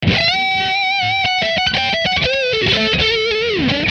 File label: 124 solo